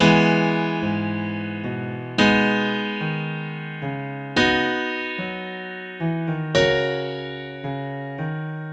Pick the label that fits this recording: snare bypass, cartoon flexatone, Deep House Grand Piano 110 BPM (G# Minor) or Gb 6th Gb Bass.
Deep House Grand Piano 110 BPM (G# Minor)